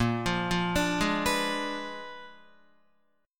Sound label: A sharp 7th Flat 9th, Guitar, A#7b9 chord; arpeggiated; JGuitar